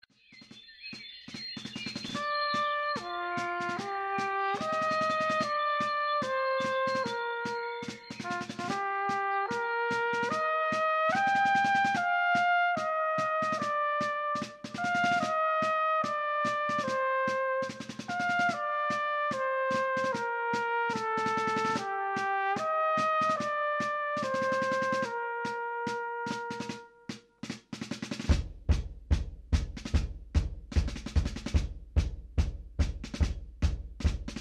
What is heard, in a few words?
Pro Brass Techno Marsch